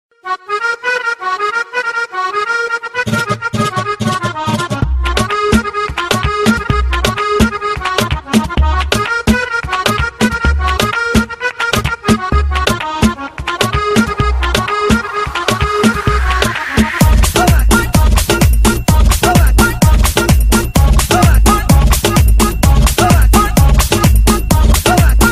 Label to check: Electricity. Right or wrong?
Wrong